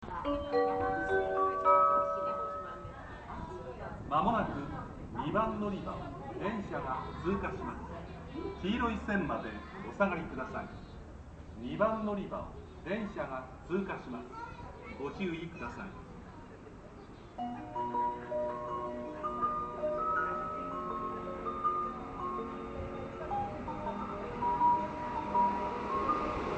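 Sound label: sakurasyukugawa, departure bell; osaka; jr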